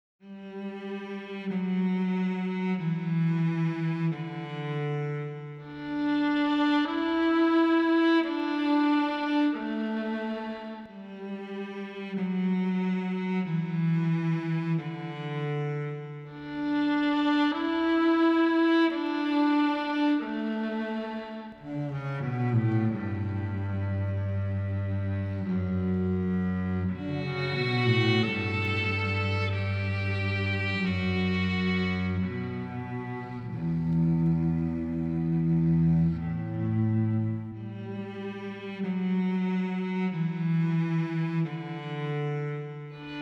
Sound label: Street Cello Viola Remorseful 90